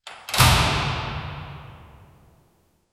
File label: vault door close